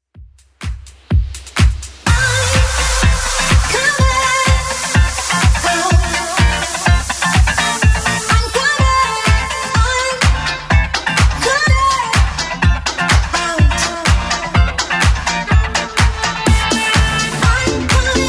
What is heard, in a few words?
80s Club Edit 125 bpm